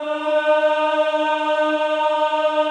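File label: Choir ES3, Ehh; Eeh + Ehh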